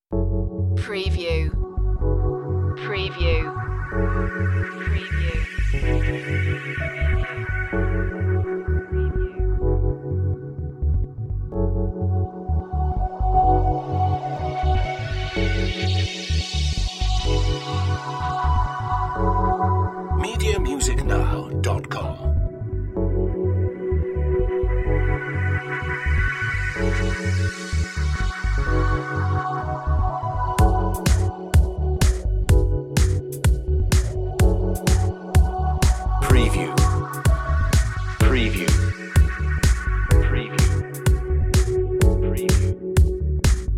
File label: underscore